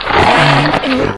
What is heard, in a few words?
worm speak; npc; svencoop; morass beta